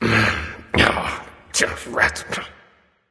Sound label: grumbling; smite; voicepack; ullr